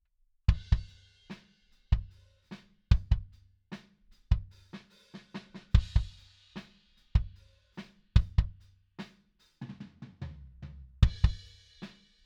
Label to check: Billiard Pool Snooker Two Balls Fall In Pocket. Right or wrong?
Wrong